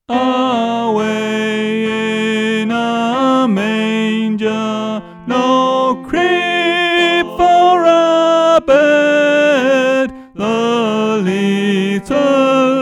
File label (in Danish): Tenor; oevefiler